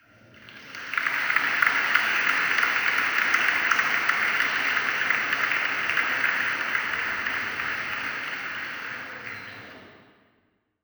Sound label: Crowd Applause